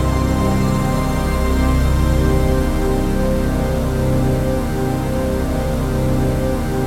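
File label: chord pad, Pads; Best Service; XX Large - Pads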